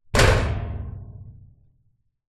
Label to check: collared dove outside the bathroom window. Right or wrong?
Wrong